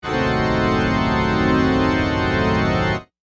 Cathedral Organ, Horror, Musical, Accent, Cathedral, Organ, Stab, Dissonant; Monster; SoundEffects-BlastWave